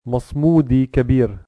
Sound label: masmudi kabir